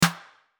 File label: Layered clap snare old school new clap reverb